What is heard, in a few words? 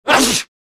Single Male Ahchoo; moans